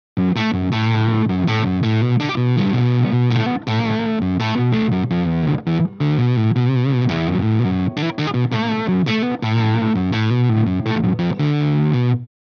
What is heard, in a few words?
FUZZ